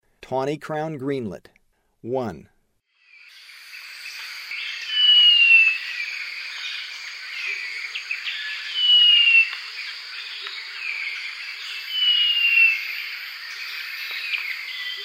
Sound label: Tawny crowned Greenlet; S. America; Eastern Lowlands